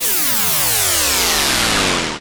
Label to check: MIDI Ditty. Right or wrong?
Wrong